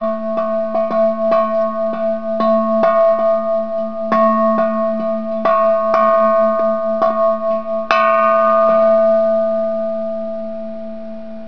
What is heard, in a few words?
bell